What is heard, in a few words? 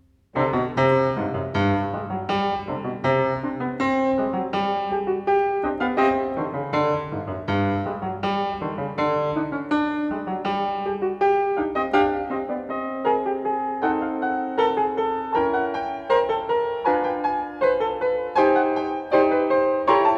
Variations in C major, Allegro pesante e risoluto, Piano; Beethoven; Diabelli Variations; op.120; Brilliant Complete Beethoven Edition; The Piano Collection